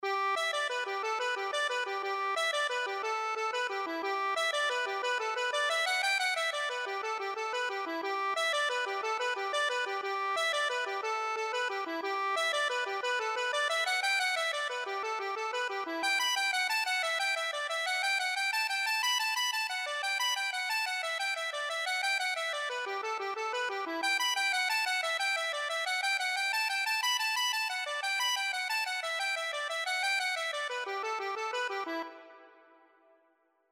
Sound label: accordion